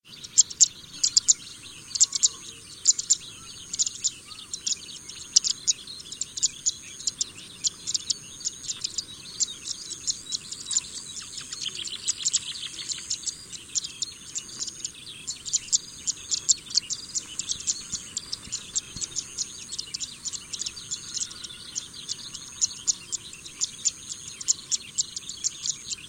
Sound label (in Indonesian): kicau burung apung